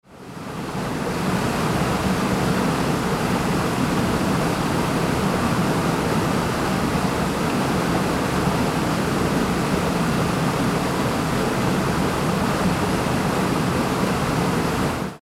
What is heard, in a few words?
waterfall